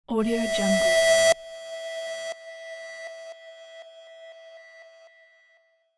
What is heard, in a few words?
transition sharp thin delayed; transitions and movement